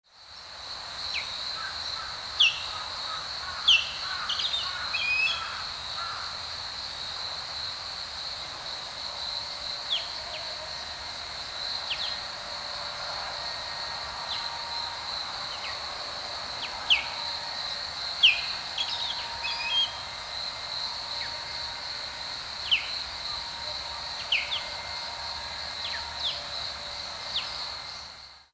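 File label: Osprey; misago; hyogo; koe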